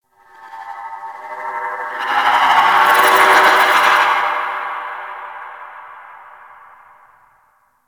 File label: Misc One Off, Ambience; Warframe; Tenno